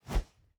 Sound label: Fighting Woosh; SoundsCrate